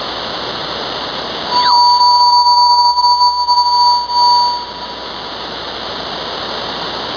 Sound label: 216MHZ